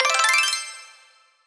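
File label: bell flourish C major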